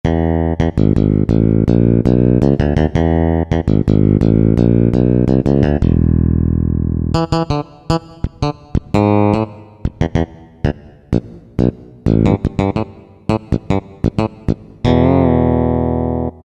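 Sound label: Fingered Bass, Roland SC-50 Sound Canvas; Factory sounds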